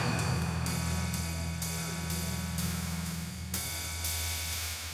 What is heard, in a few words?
drum roll